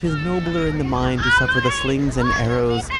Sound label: noisy